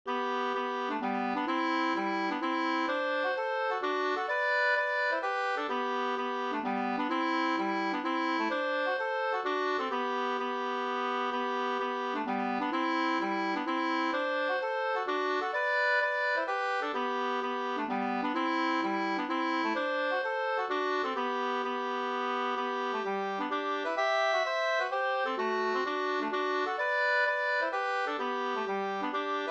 hambopolska

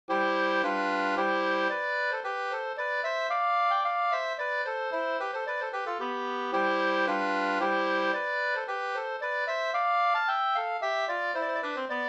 slängpolska

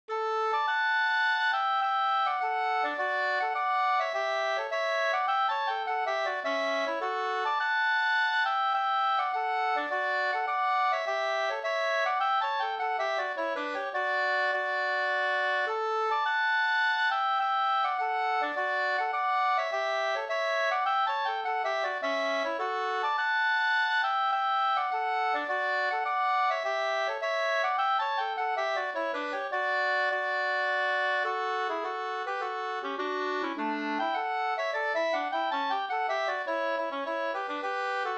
polska Brudpolska

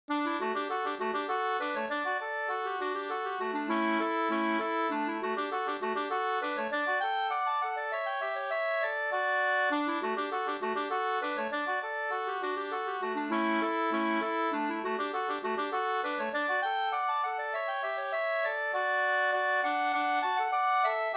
engelska

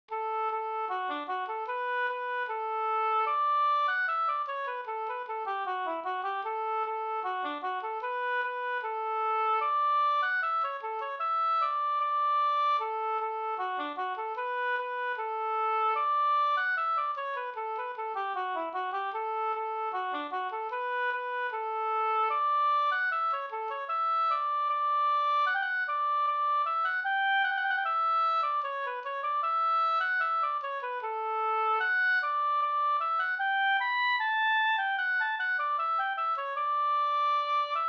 brudmarsch Ridmarsch

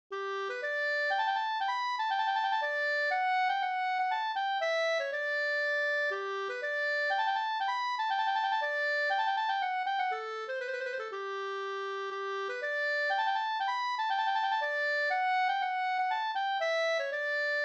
polska Bodapolska

